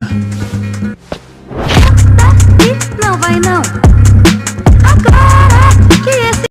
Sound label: punched